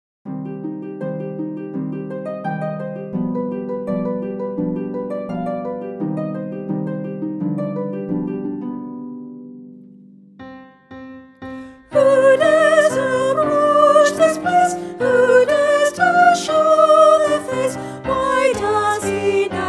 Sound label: Furies Chorus VOCAL